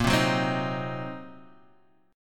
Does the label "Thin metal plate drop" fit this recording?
No